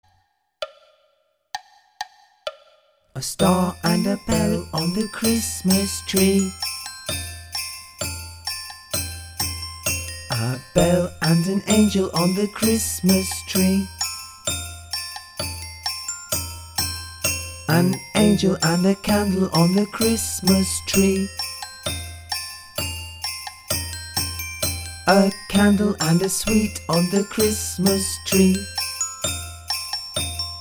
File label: chant